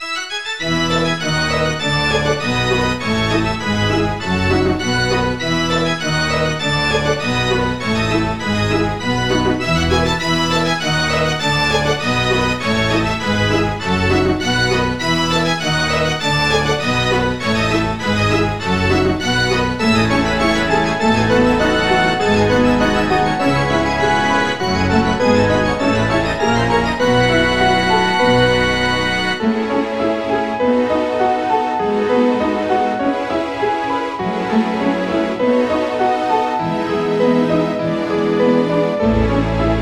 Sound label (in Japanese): 聖地の街, BGM